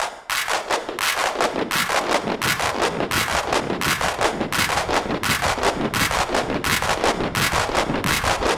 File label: MEGACLAPS; Zero G; Malice In Wonderland